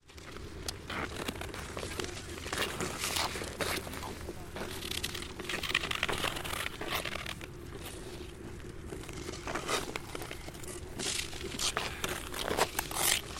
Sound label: Ice skating; Great Outdoors